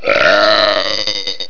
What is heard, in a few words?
moped